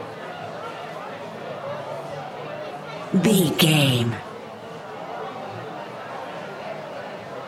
background people crowd noisy chatter talking mumble loop